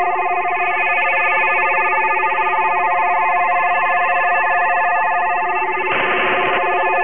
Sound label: 3663 USB FSK